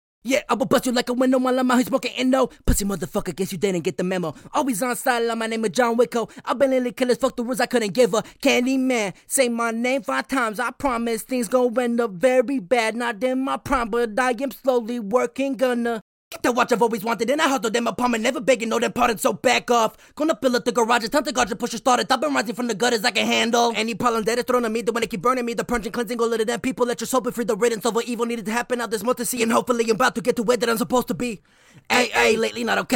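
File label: hip hop, 125, D minor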